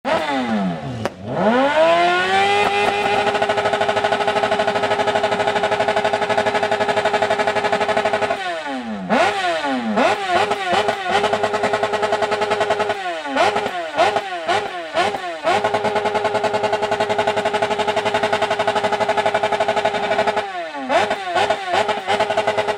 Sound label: ducati; riegel